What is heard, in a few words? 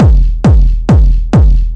135 bpm, executioner hard techno kicks, drum loops and breaks